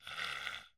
creature choking; angband

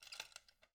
open case; angband

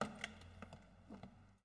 door entrance; angband

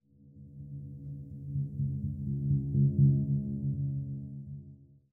pulse low; amb; angband

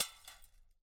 break canister; angband

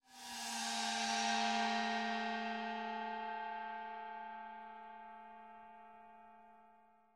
gong chinese; amb; angband

